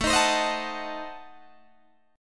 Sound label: A Diminished 7th A down Guitar Open G